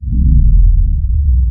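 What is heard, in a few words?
city ambience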